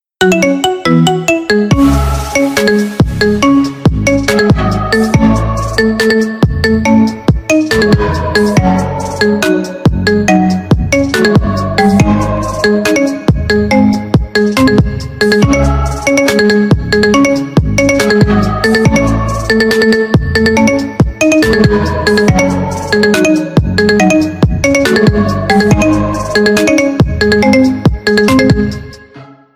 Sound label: iphone 7 marimba remix